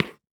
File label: Foley Footstep Golf Cleats Skid On Cement; Foley Footsteps